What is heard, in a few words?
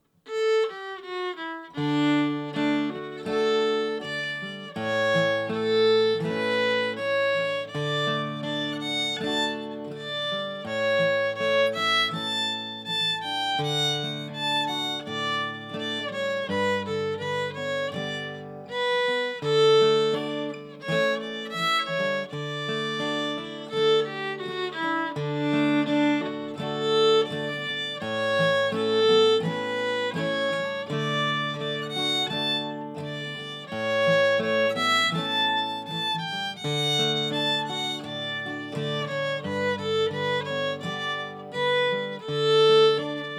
Slow and Fast with updated chords; Minstrels Strain